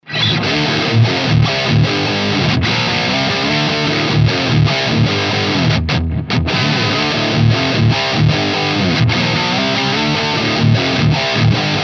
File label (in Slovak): Dual Diody Ultra Modern 2 kanal